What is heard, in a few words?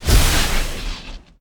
boss rocket shoot; spider-man-mysterio-rush-game